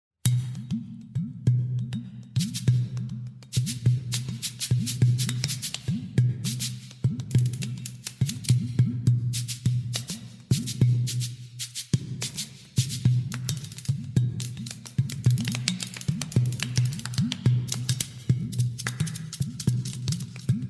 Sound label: Udu